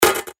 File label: percussion; Baker; revealed percussion vol 2